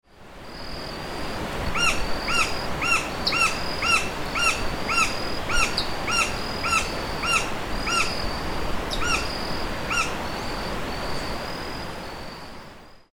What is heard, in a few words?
Colaptes melanochloros Pica pau verde barrado